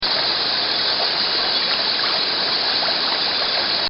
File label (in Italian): Ruscello, Outdoor; Outdoors; Backgrounds; Bobby Cole; recorded in a custom built sound booth